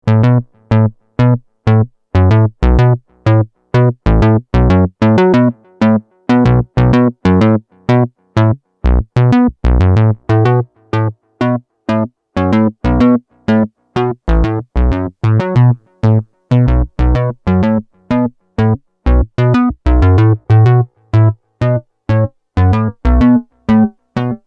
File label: bass